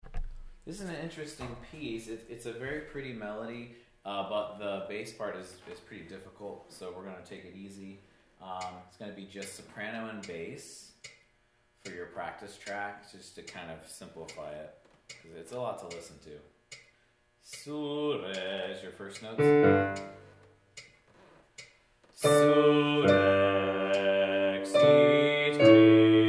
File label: Bass; Surrexit Christus Hodie, A. Vierendeels; Choral Resources; Bass practice